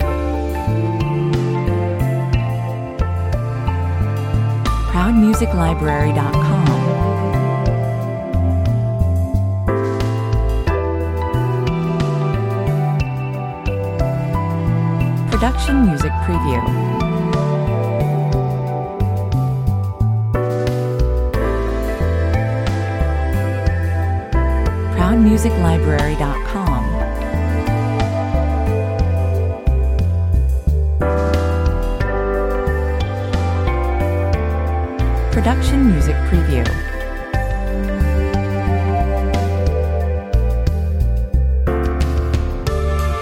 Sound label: Floating and gently. Quiet steady groove with many fine sound garlands; Talk over version.